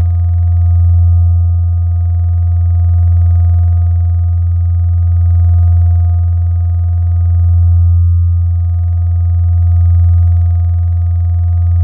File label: Keys Belleste; Synthetic's Serum Collection; ESW X Keys